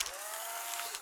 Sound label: Camera-Broken Print